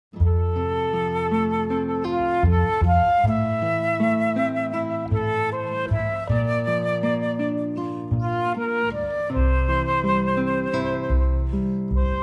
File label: guitar flute bass trio